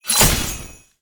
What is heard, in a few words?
imp water sickle